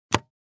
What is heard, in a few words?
Cassette tape recorder push stop button